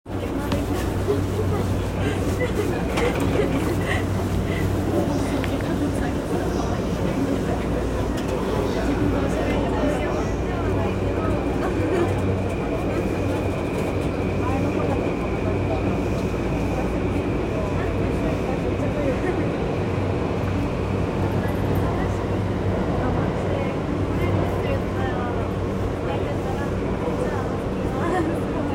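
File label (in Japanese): 山手線 大崎 clockwise, train sounds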